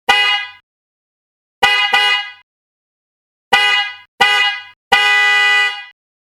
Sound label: proud horn